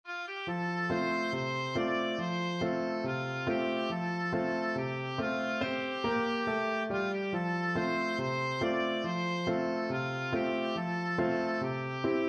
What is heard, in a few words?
alabama, oboe